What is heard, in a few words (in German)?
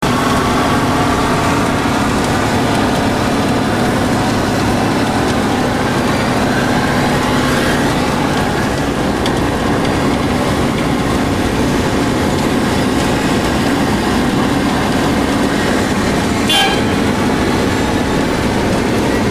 nachbaustelle, tiefbau